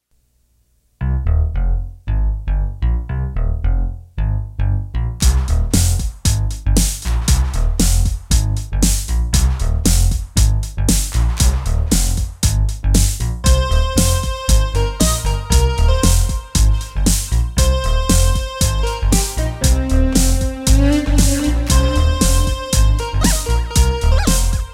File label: Bass Face Jam